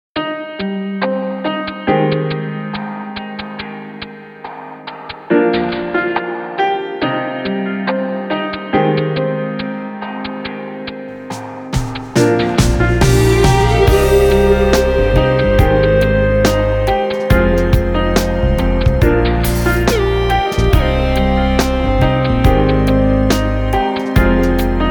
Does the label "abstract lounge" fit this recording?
Yes